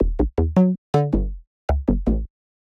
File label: shifty analog ish bass